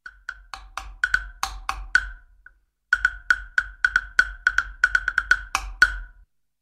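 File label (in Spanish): Temple block, Percusión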